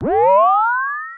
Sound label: Bleep